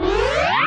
low life

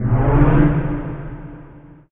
game show menu

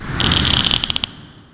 gauss hit

